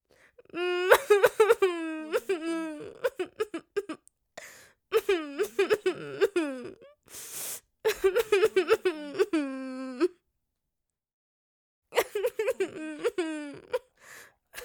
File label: girl crying pack